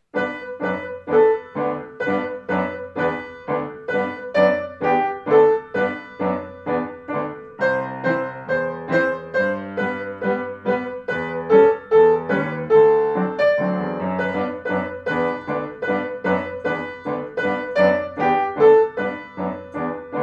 Jingle Bells

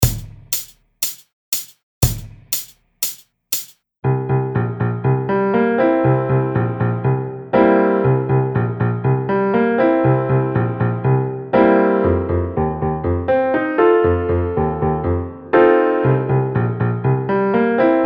ON BASS

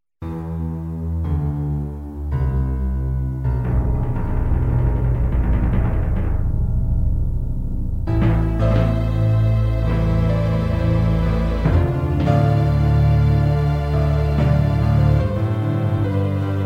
Orchestra Music; Amy’s first animated film, “The Stars at Night” (2008)

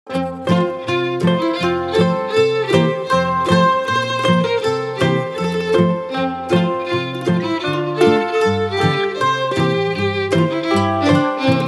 Bluegrass; Hark! The Herald Angels Sing

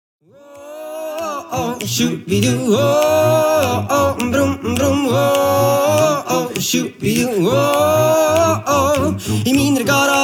Garage

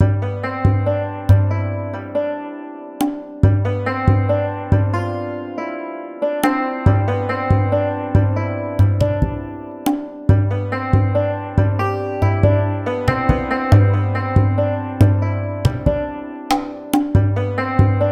ancient groove

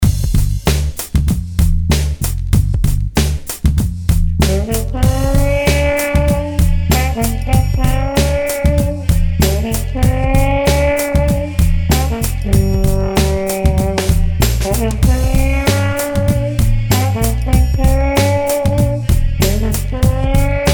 Bone Crunch Blues

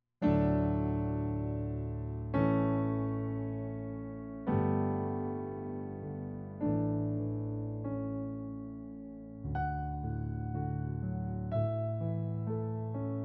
Canon in D; Canon in D {Excerpt} (Pachebel)

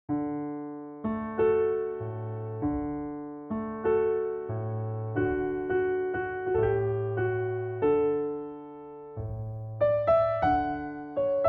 tango

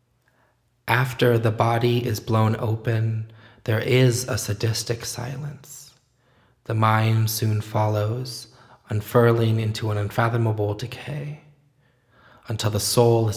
just vocal

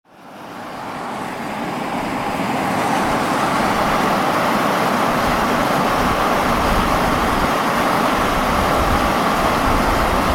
Iceland, Steam Vent, Myvatn

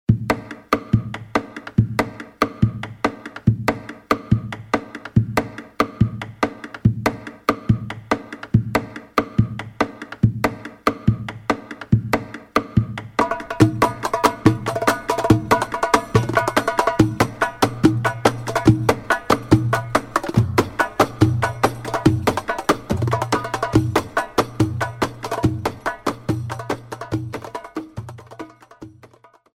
Al-Accordion

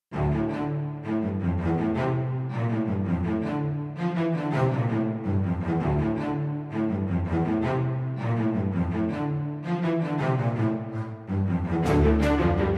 Film Cue for Orchestra, Action Strings

